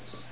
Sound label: squeek